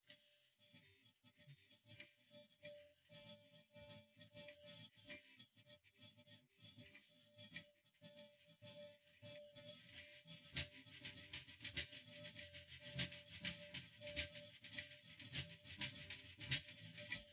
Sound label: percussion